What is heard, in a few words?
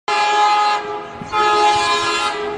class 22000 intercity railcar